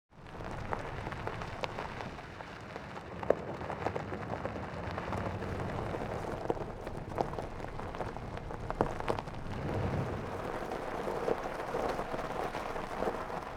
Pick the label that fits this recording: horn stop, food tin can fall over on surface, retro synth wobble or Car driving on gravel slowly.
Car driving on gravel slowly